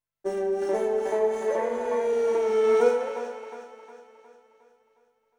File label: FLUTE ETHNO 125 A